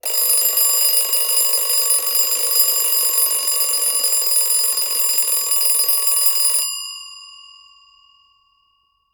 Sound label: Alarm Clock Bells; Clocks, Watches and Timers; Blastwave